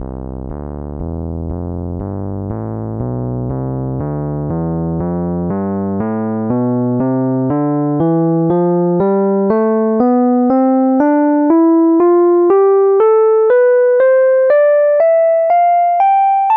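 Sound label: rhodes electric piano; tonleiter; SpectMorph Evaluation #4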